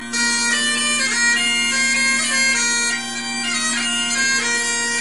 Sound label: Bagpipes